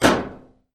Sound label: hit metal; Gumball - Go Long!